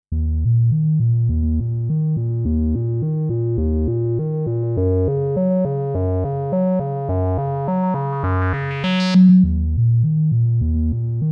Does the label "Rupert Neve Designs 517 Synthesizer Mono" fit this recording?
Yes